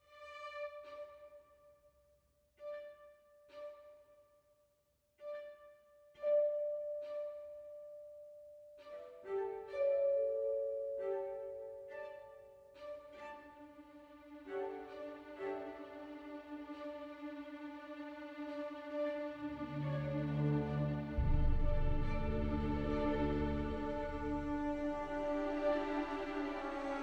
World Music; Jurassic World Evolution 2; PS4; Windows; Xbox One; Xbox Series X/S

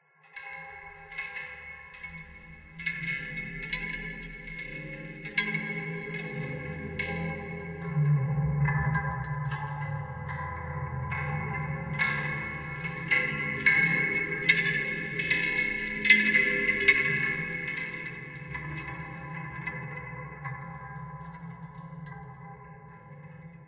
Woodhits Sample

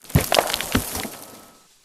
sound Spikes Breaking

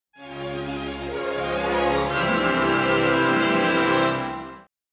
Hero Victory; heroes of might and magic ii 2013

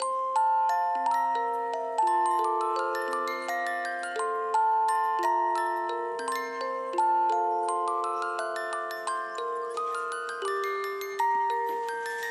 Music Box Loop; the walking dead michonne

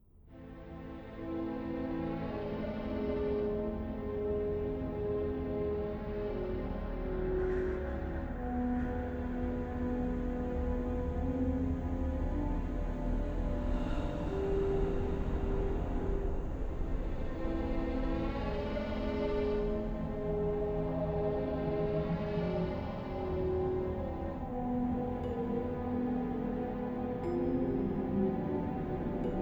Horror Mild; Sherlock Holmes The Awakened

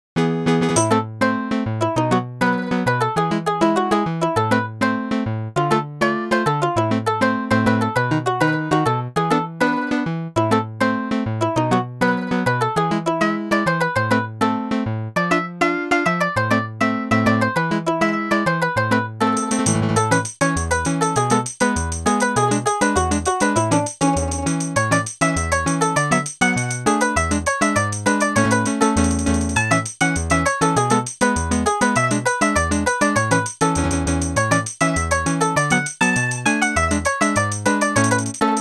Chorinho Instrumental; animal crossing new horizons original soundtrack 2 2022

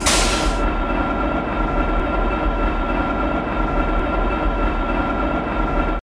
Air Compressor Tire Fill

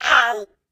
Bird Collision Low; Angry Birds

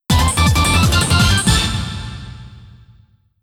Game Cleared BGM; tokimeki memorial girl s side

